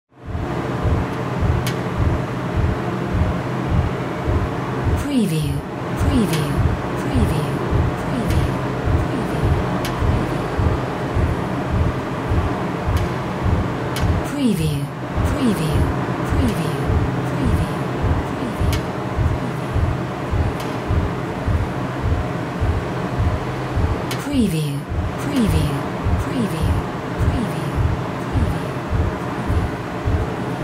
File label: AMB VENTILATION SHAFT